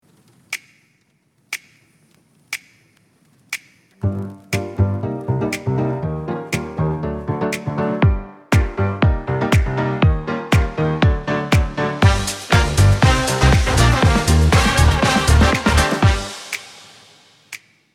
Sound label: music